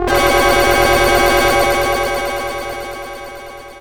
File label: DINGER DONGER, OBI FX; Zero-G Ambient 1